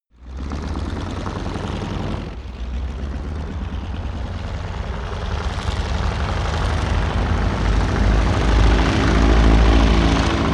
propeller plane ju52 take off

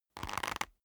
leather creak short soft

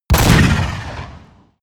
ballista fire hard

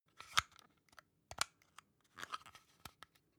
plastic hook adjust

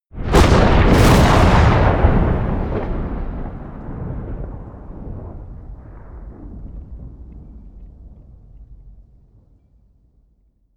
generic thunder oneshot close

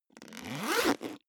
zipper backpack open accelerate

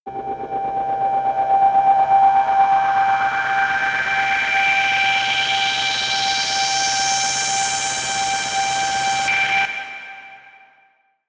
rise hum whir high

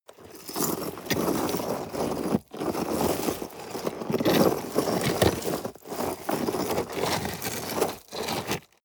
scrape rocks long

